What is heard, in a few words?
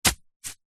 wood steak sharp post drive by hand into dirt through thick grass; foley